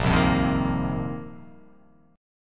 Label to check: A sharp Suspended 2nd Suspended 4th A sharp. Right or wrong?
Right